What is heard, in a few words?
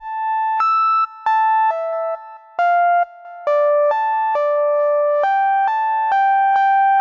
136 high pretty melody, synth and electronic loops